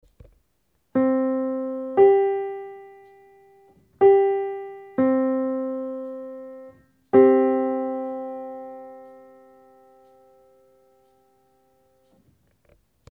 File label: 702 Pure 5th